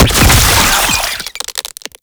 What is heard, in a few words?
Brood Spider Explosion; Warframe; Oraxia